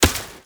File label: Step Dirt; Enemies; Foley; Footsteps; Warframe; Duviri; Dax